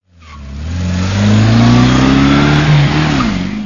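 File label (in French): auto poursuite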